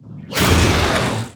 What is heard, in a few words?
DragonTurtle Attack